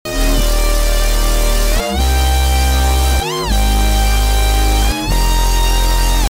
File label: loop ding; Cynematics